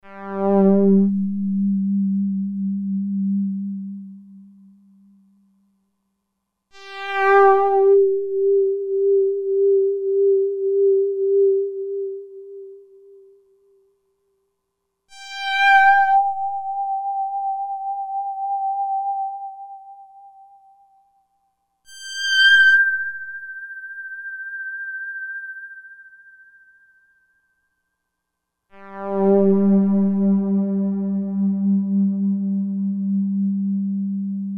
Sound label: Lower Tone, Roland D-50; 37 Soundtrack